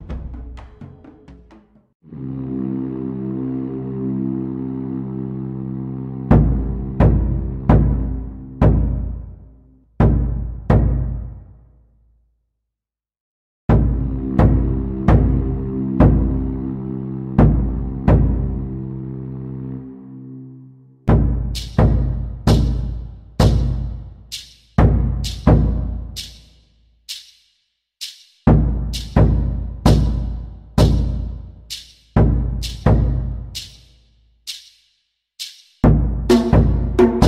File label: drums